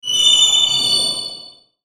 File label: Tinkle Melody, Tinkle Melody Reverb; Trailers; Blastwave